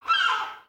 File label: Seagull Call; creatures organic